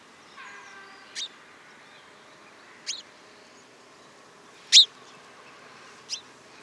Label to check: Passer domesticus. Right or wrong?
Right